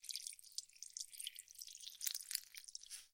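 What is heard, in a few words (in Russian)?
water gentle distant in space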